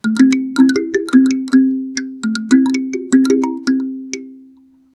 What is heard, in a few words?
african victory sound